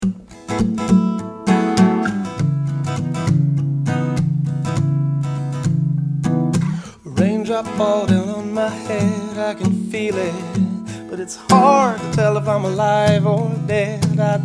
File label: Raindrop Acoustic